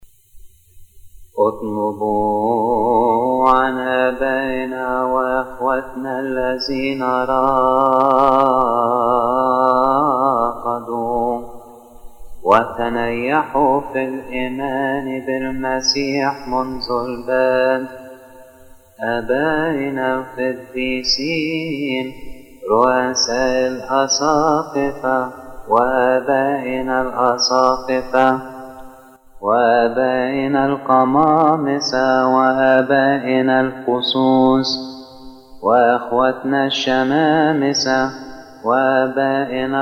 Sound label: departed arabic; Altar Responses